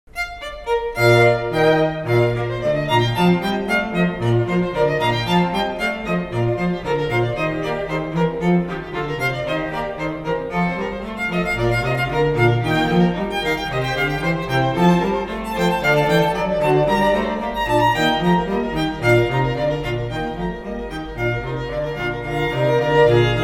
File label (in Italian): Allegro; Vivaldi